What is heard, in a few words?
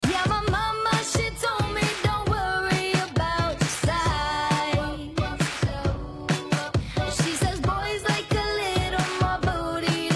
Bassless Superloop